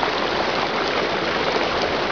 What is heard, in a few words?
WFALL; Renaissance